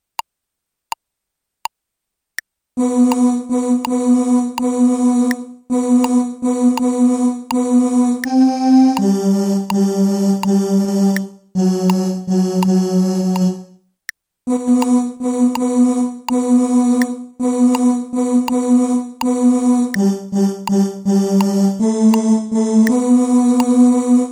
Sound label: tenors; Glory